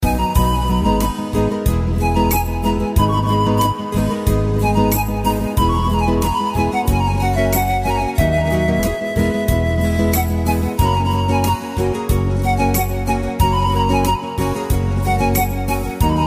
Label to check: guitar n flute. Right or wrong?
Right